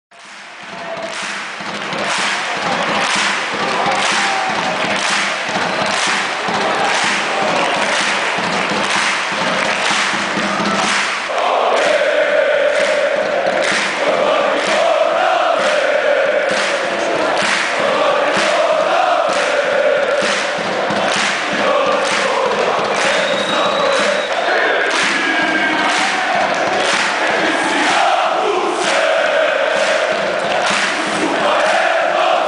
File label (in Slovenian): zvonjenje